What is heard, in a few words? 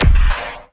Zappy Fx; Sonic Foundry (Sony Creative Software); Esoterik Beatz